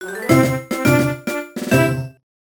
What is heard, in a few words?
Music Minigames Vs. Battle - Draw; NSMB